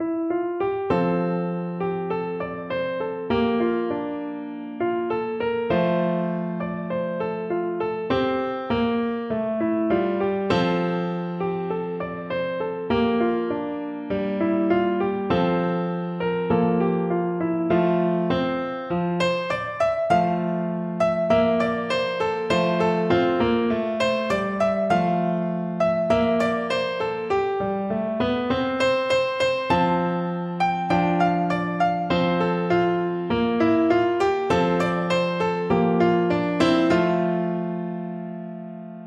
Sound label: piano